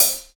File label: HAT; East West - Phat & Phunky CD2; 90's Sample CDs